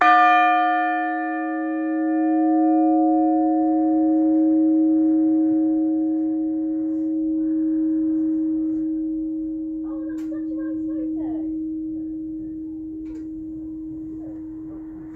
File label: bells of sussex; turners